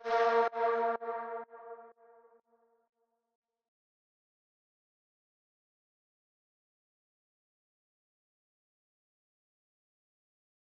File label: 125 BPM A# Min Synth Strike; Melody Loops; Loop Stems; Cymatics; Antidote